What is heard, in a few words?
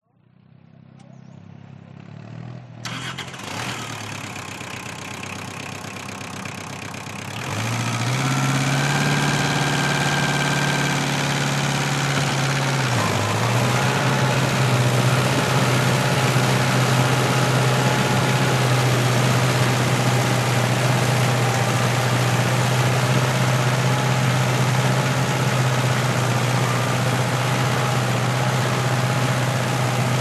Kubota Tractor Working In Ricefield